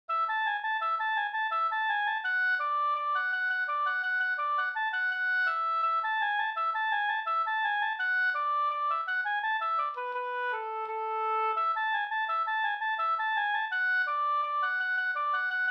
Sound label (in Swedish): engelska; fr Nattraby